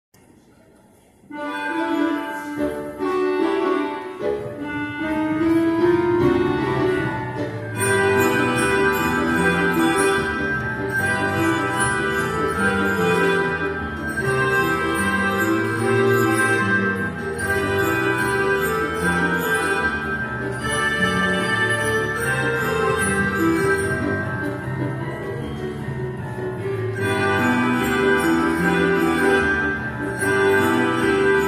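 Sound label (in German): grundschulorchester rock n roll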